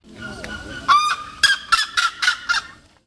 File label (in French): oiseau exotique